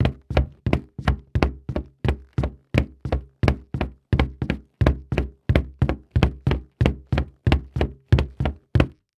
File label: Foley Footstep Golf Cleats Hollow Wood Surface Stomping; Foley Footsteps